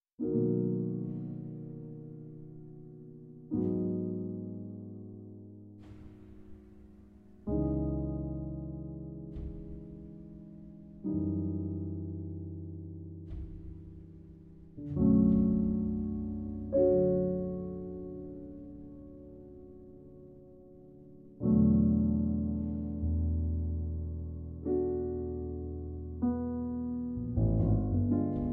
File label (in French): Jazz Douce Musique d'Ambiance